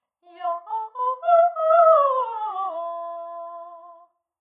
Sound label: operatic